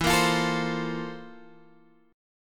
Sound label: C 13th E